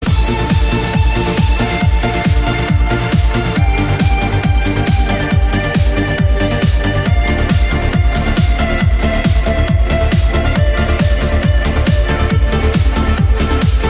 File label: Trance